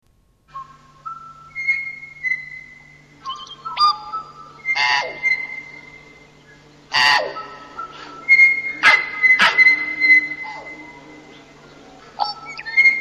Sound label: tui